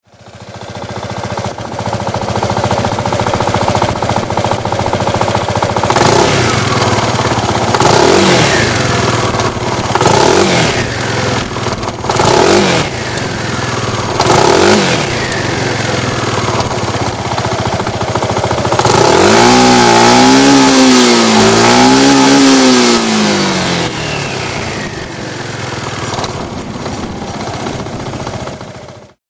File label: yp250 exhaust note, bike